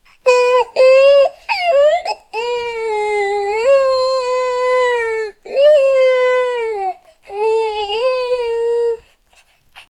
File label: fat dog comforts; colette